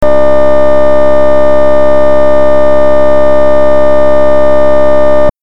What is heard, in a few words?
binary code anthem